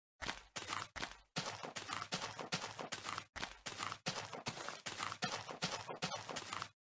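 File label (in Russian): shagi po trave, footsteps on grass; minecraft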